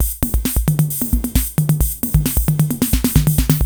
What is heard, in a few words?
KR55 ROCK, drum; Crypton - DrumBase5000; KR55B